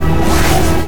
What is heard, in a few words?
asuran gun; stargate